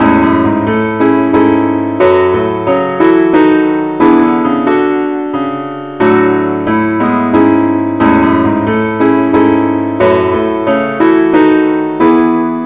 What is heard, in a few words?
keyboard only key a; Amazing Grace; John Newton